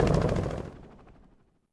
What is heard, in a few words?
Gunship Run End; Civ4 warlords